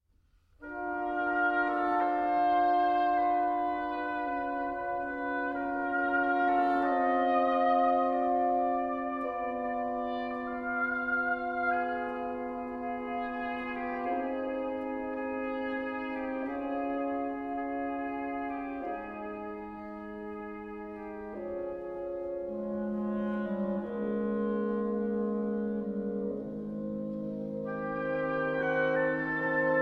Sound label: Violin Concerto, Poco Adagio